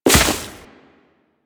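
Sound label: Crewman Step Vegetation, Footsteps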